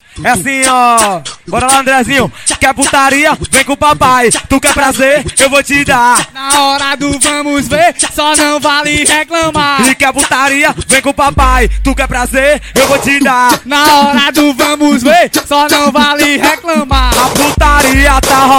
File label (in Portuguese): ao vivo funk; ao vivo no efp fest